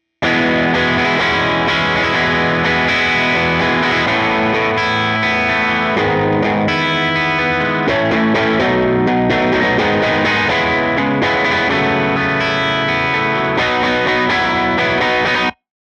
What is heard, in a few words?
crunch